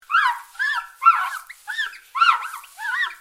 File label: glass wipe polish